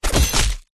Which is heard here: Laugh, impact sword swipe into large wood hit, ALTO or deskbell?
impact sword swipe into large wood hit